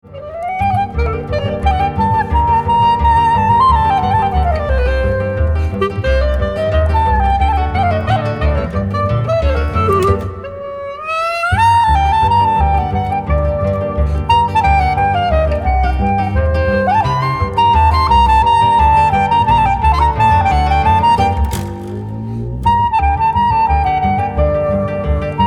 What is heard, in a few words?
Freylach